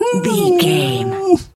bear pain whimper

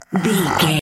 zombie voice groan

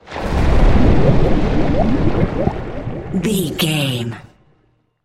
water spell tidal wave drown

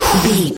voice male breath single

